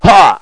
Kick; The Big One

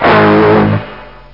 big bonus; Krusha

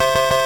DING; UHOG2000; sbslot

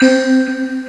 low bell; Descent

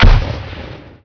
frag grenade det; SpecOps2 MPlayer Demo

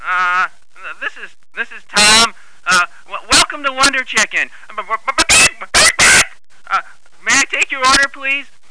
chick; Blender Magazine volume1.3 1994

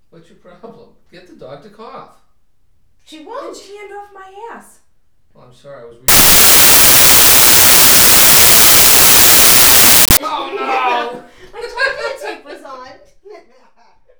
bloop

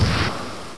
hydra; Flying Nightmares Demo; Flight Sims

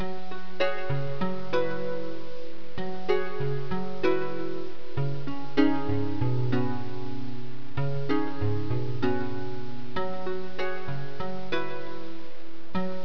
tune; Professor Multimedia

